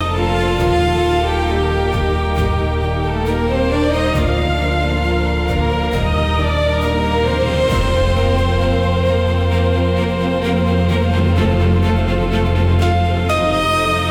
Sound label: loop